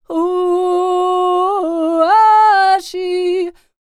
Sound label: SERBIA, Vocal World Voices SERBIAN; ILIO; Vocal Planet VOL-5